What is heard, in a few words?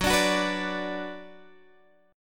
F sharp Suspended 4th Sharp 5th, Guitar, Open G; x,11,12,11,12,12; down